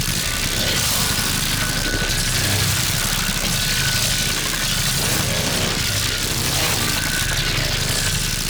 Beam End Point Loop; weapons; Warframe; Corpus; Tenet Quanta

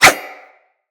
Dagger Whoosh; Weapons; Dual Daggers

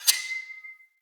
Short Sword Warning; Weapons; Enemy; Swords; SinecureShortSword

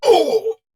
Pain; Warframe; Dax Ashigaru; Battle Barks

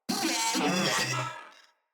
Moa Vocal Enemy Spotted

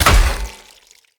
Polearm Large Impact Flesh; Weapon Impacts; Enemy

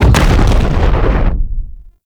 Dropship Explode Punch; Ambience; Gameplay; Grineer Prime Dropship; Warframe; Tau

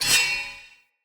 Curved Sword Whoosh Accent; Weapons; Player; Swords; Sinecure

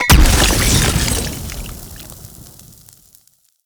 Mech Oil Grenade Explosion; Vehicles; Abilities; Warframe; Nechro Mech

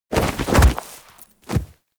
Fall; Warframe; The New War; Drifter; Foley

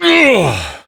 Struggle Short, Adult; Warframe; Duviri; Drifter; Efforts

